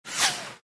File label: shell whizz